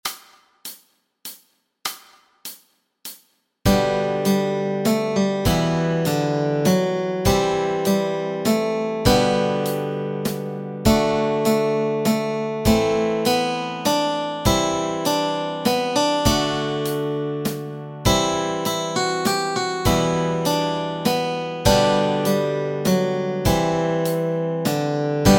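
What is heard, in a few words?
melody and chords, Easy Guitar and Ukulele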